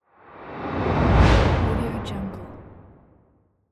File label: whoosh transition